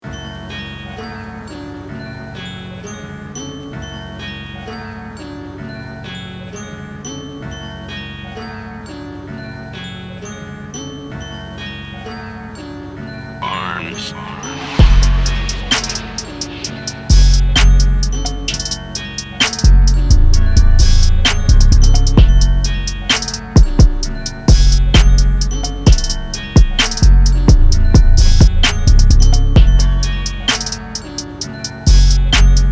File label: Instrumental